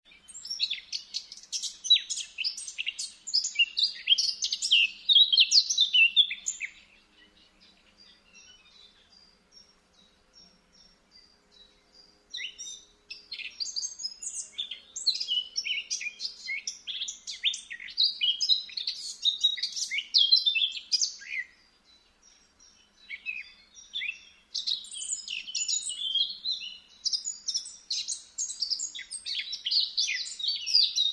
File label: Sylvia atricapilla; SETE